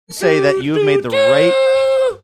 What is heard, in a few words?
singing opening notes taps